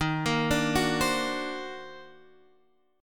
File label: D sharp Minor Major 13th D sharp, down, Guitar, Drop D